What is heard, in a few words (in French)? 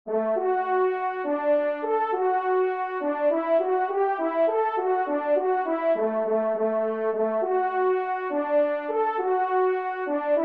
Valse Do Maj TRP